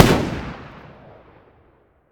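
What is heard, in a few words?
weapon ar fire player atmo exterior; gmod; viper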